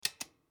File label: toggle